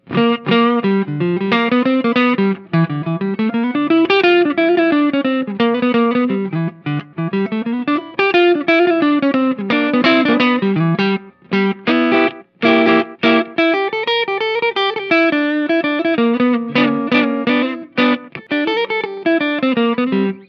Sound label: jazzy neck pu